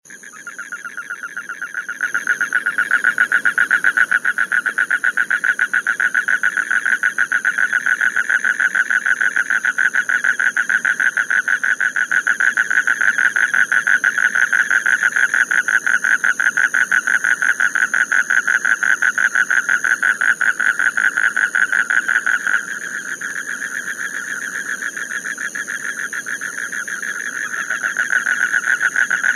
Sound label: spectacled toad